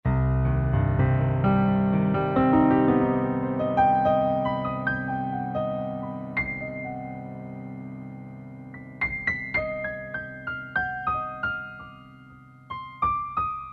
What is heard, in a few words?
St. Concert, Roland SR-JV80-09 Session